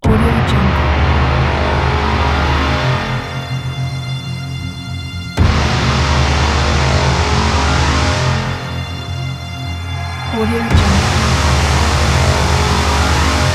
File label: trailer